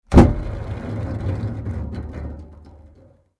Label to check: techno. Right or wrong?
Wrong